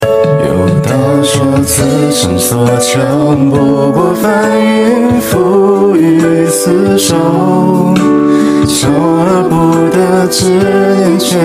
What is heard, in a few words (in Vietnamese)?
Nhac Chuong Nhac Trung